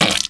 wet splort

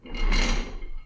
Office Chair Get Off; Welcome to the Game II

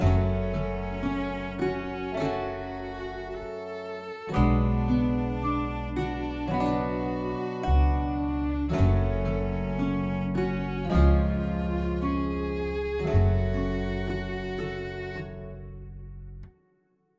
result screen music loose; Talent Not Included; macOS Windows Xbox One

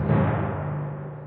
Tymp

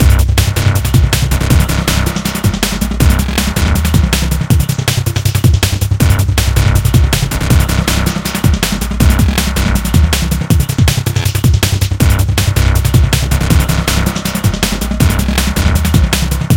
Space DnB; Unreal Tournament 3; PS3 Windows Xbox 360 gamerip 2007

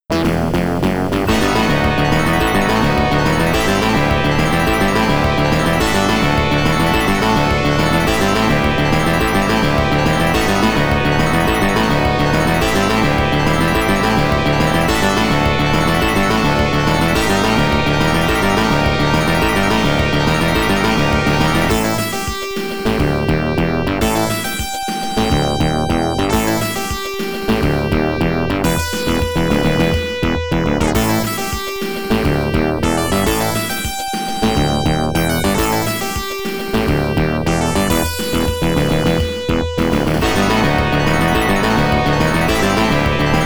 Boss Fight; Funky Jet; arcade

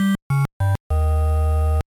Jingle, gba; backyard baseball 2002

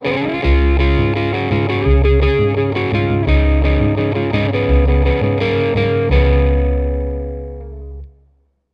Bari Sax; simpsons hit run; ps2

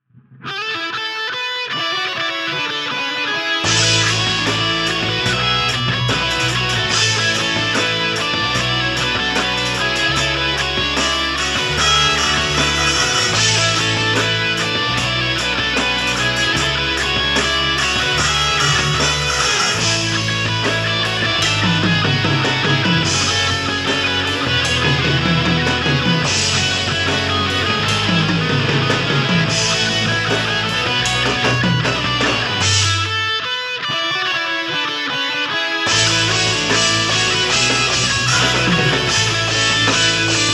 Guitar Cover; S.S Anne

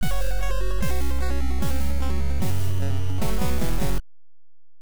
Battle Failure; overlord nes

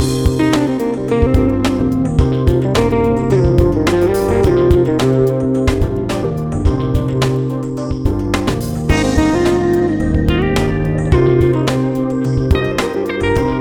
tranceendental blues

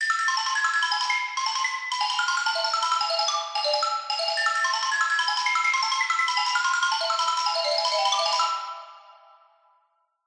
Xylo-bone; Fortnite; emotes chapter 2

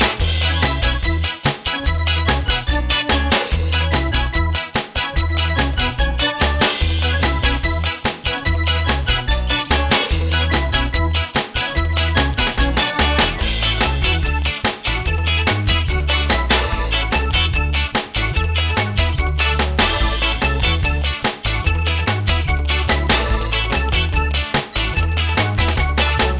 Ska Park Loop; Babysitting Mania; nds

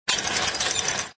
Pedal; Extra Lives

